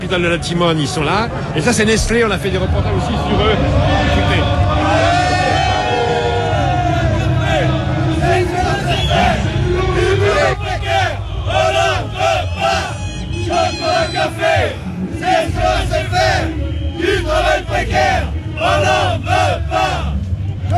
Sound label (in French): Manif a Marseille ambiance